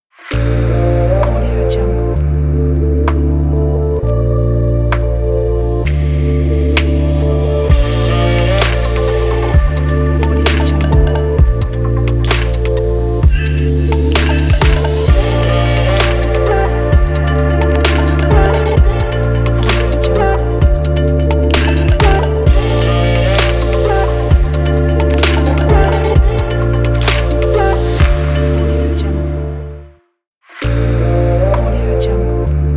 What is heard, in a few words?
fashion chill luxury ident; puremusic